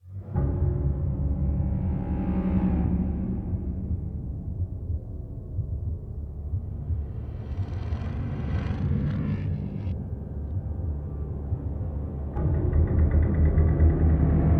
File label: Horror; Spore unofficial galactic score